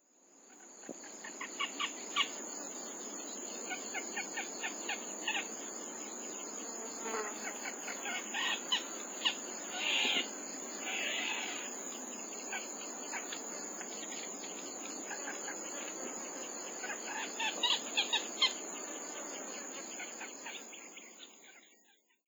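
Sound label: Yellow Bittern, watarase; ヨシゴイ; 巣立ちビナ; 栃木県渡良瀬; 平野敏明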